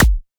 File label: Kick